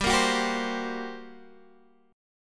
G Minor Major 7th Double Flat 5th, G, down, Guitar, Drop D